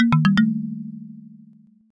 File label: grave jingle; gbjam12; jingles